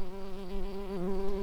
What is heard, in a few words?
bumblebees, Hypnorum workers